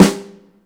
OPEN SNARE; InVision Interactive; Lightware VOL-1

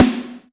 Snare, Alesis DM5 Drum Machines

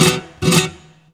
GUIT CHORDS; Best Service; World Colours

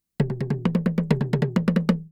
Toy Djembe Toms, Asia; Sonic Foundry (Sony Creative Software); Continental Drift Aftershocks

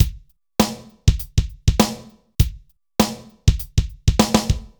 dry Loop, BPM 100

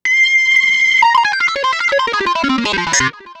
Modal Riff, Keyboards

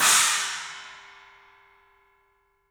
CYMBALS; 3D Sound; 3D Drums

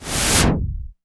cinematic impact wipe

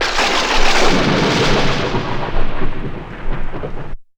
Thunder; Jungle Warfare 1&2

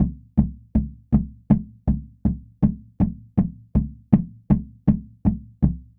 Pow Wow Drum South, Native American; Sonic Foundry (Sony Creative Software) - Continental Drift Aftershocks

